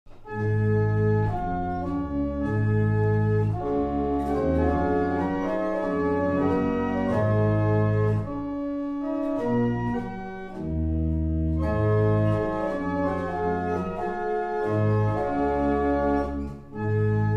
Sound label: Double chant, barrel organs; Bressingham